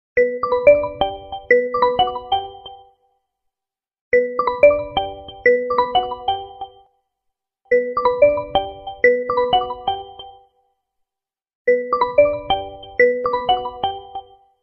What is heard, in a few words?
Oppo Realme 10 5G Ringtone